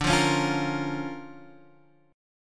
D Minor Major 7th Sharp 5th, D, Guitar, Half Step Down